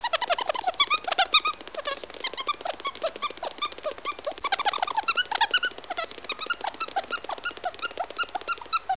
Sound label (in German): Mauseloch